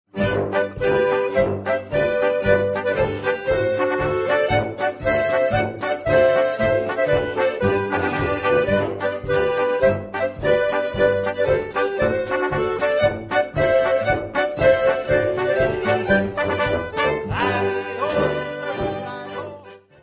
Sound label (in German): Sternpolka